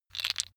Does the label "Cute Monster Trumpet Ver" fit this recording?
No